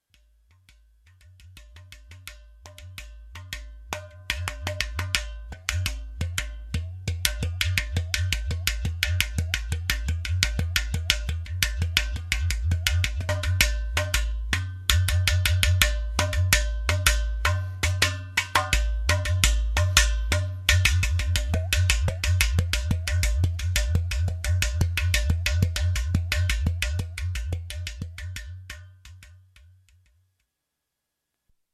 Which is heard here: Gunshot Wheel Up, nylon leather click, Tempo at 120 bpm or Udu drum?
Udu drum